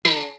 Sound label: ping back